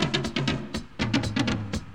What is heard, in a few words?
Dance Grooves